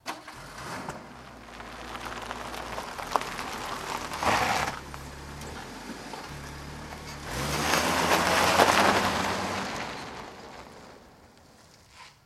Car, Start Medium Cu, Reverse On Gravel, Stop Cu, Away Medium Speed.